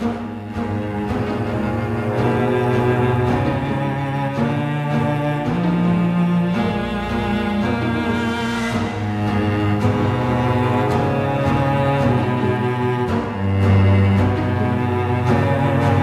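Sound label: background music preparation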